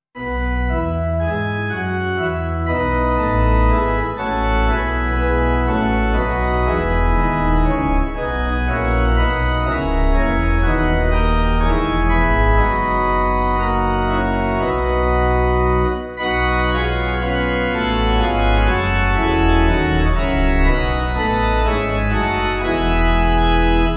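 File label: Pipe Organ, Music Backing Files for Churches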